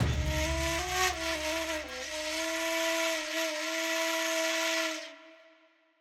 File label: Lose; GlobalGameJam 2021